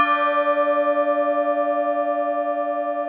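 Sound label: bell sounds double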